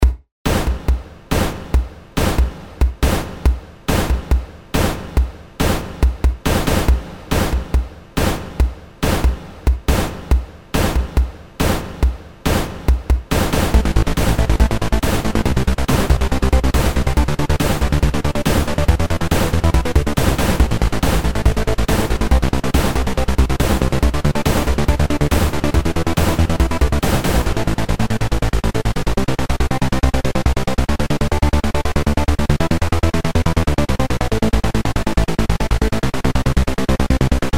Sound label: Micro Music